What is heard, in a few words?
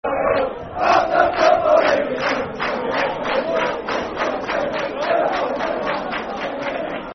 E I E I E I O football chant (soccer song); Brentford